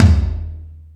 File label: Toms, Drum Machines E-MU Pro-Cussion